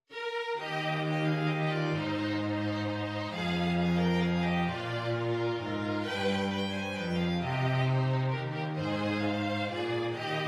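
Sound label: We Wish You a Merry Christmas violin violin cello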